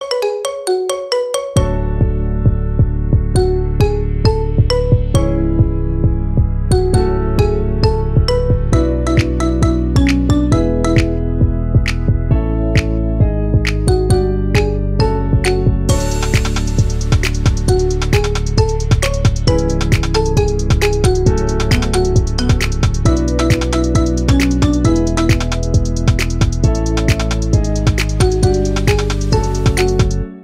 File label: Marimba Remix